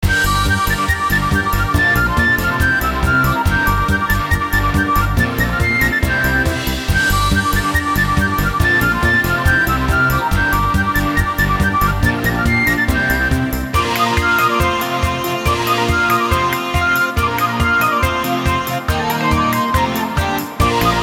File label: free spin melody; Royal Unicorn